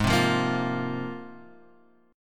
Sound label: G sharp 6th Add 9th G sharp